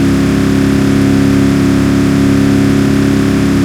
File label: Acc, v8 c63